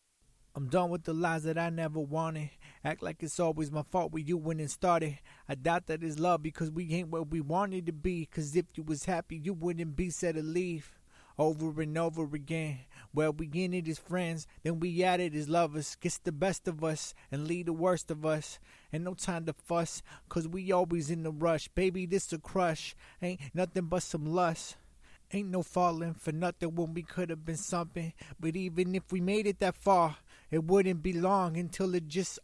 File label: 170BPM Key Gm, Acapellas